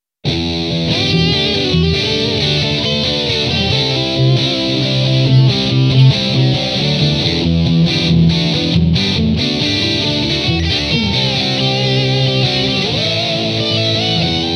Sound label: Maxon SM9Pro+ effect